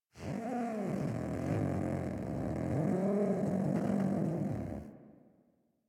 catbrow feral vocal idle; Warframe

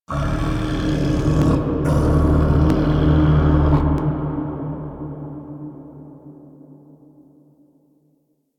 Shouts; Entrati; Warframe; Man In The Wall

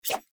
Dagger Whoosh; Weapons; Enemy; Daggers; Sinecure Dagger

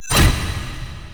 Stairs; Warframe; Entrati; Vaults

